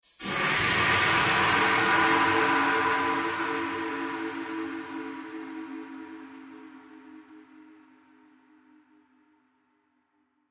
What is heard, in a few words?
Horror Hit Metallic Swirl Ghostly Descend; Blastwave